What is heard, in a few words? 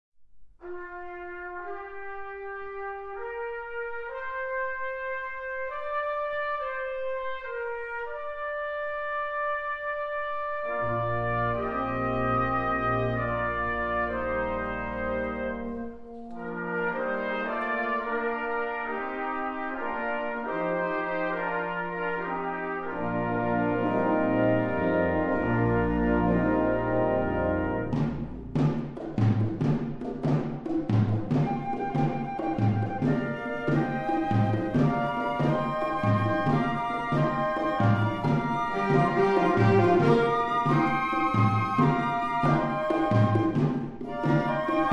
African Samba, wind band